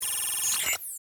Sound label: interface beeps deny; sonniss gdc